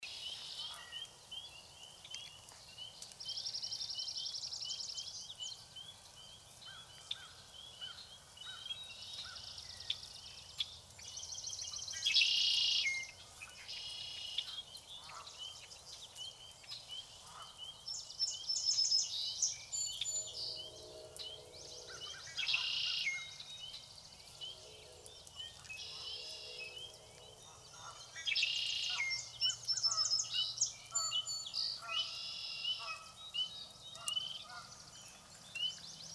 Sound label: Frogs Blackbirds Train Geese flyby More